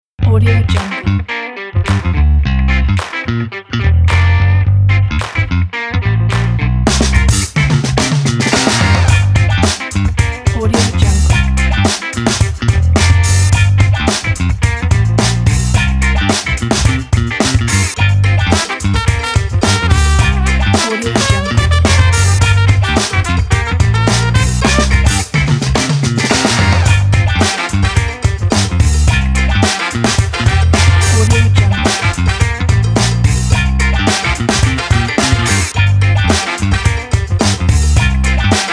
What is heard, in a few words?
that funk; sonar-audio